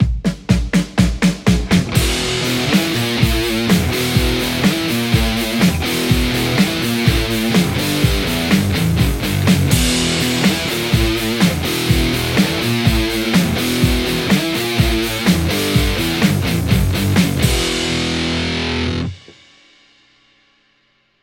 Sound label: lespaul; poulin soloc